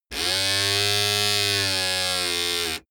servo

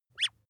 bath duck release short

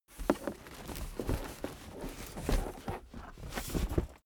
cardboard box big lift handle

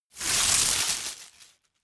tear

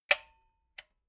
metal button stereo spring click slow

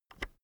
keyboard external enter push

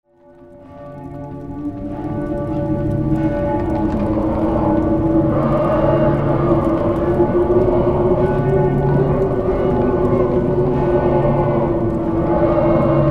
witch mob

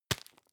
tissue tap hard

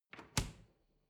refrigerator door close short

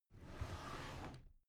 drawer cabinet carton open soft halfway

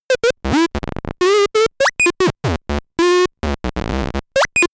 talking arcade

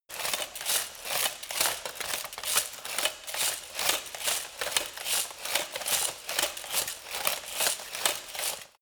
light walk fast sequence

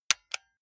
console controller xbox rt press

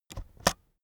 keyboard external space press slow

